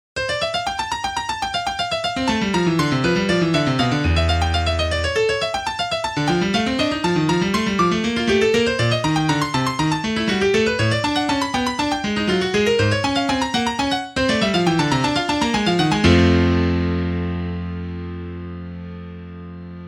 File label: invention